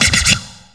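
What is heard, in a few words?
scratch fx left; Big Beat Samples & More; AKAI; SHORTFX KIT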